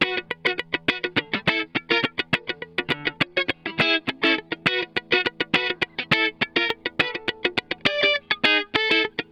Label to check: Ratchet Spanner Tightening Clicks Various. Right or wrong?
Wrong